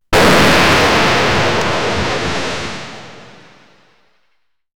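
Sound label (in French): un bruit d'attaque de tornade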